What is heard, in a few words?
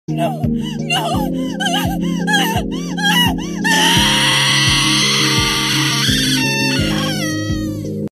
female screams; yandere